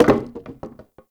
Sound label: Wood Boarded Wall Medium Destroy Chunk; Objects; Destructible Barriers